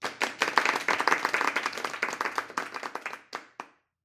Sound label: audience claps